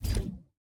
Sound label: Hand Paddle Release Light